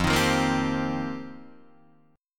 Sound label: F 9th Suspended 4th F Guitar Open D, chord